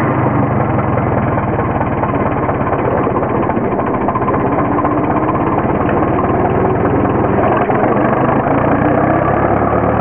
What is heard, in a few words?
farmall road